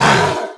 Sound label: gloves attack; weapons; breadmonster